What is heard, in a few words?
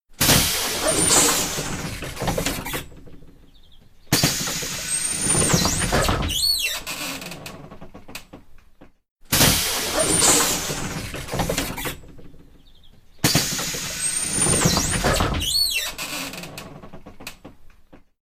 street sound